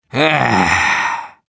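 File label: negative vocalization, Russian; Demoman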